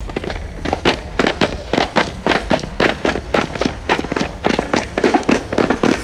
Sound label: synchronized platoon marching on hard surface